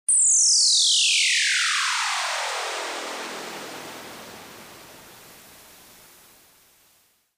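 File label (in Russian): космический переход кадра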